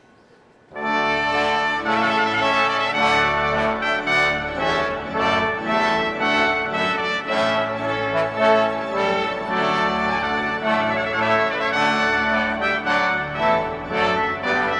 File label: trumpet tune; j clarke